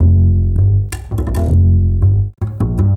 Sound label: Standup Bass, Drum N Bass